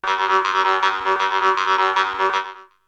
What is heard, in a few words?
Melody loop; ZERO G - Datafile DISC 3